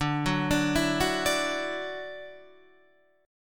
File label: D-Major Add 9th D down Guitar DADGAD